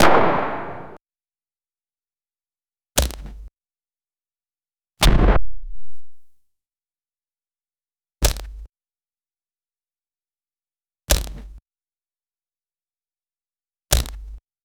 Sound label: photo shots impact, camera